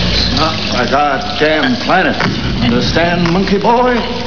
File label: monkey